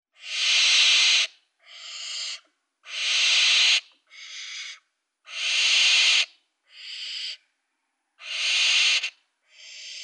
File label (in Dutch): Kerkuil blazen en sjirpen van jongen